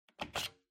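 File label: Ceiling Light Remote Control Holder Take Out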